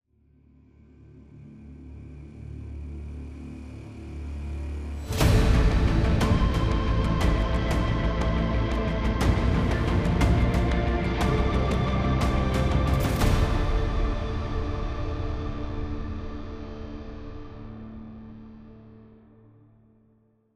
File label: Character Select, Solo Mode; apex legends season 12; Newcastle